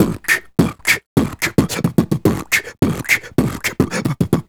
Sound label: Beat Box, Hip-Hop and RnB Vocals